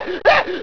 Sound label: SCREAM